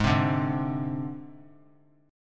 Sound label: G sharp Major Flat 5th; G#Mb5 strummed; JGuitar